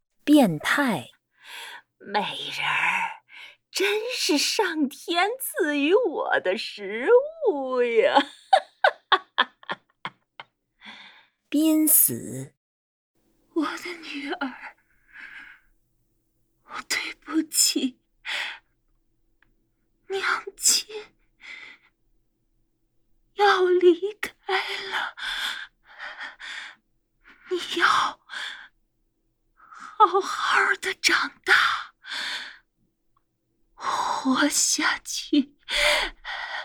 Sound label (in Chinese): 变态濒死怒吼生孩子